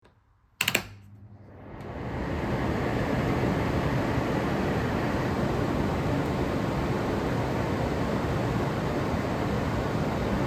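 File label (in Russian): zvuk vytiazhki kuh, kitchen hood extractor sound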